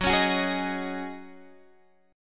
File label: C sharp Suspended 2nd Suspended 4th F sharp, chord